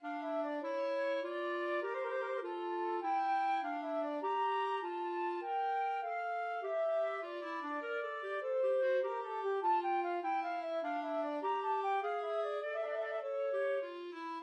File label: Minuet flute clarinet duet